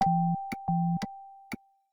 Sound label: Car Alert; Samsung Galaxy Z Fold 7; SoundTheme Galaxy